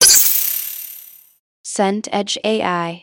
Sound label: scifi shield break